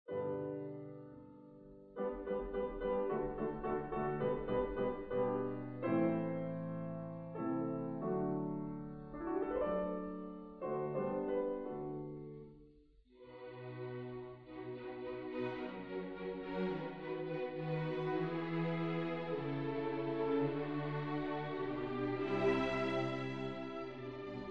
Piano Concerto No. 4 in G Major, Op. 58 I. Allegro moderato